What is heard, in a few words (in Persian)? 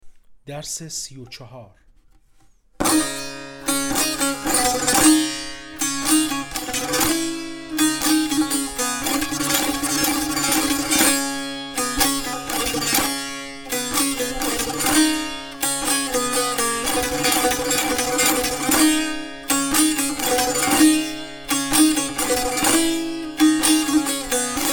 مشق تنبور; کتاب هفتاد و دو مشق تنبور دوره مقدماتی